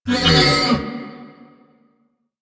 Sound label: Ram Idle Grunt; Duviri; Animals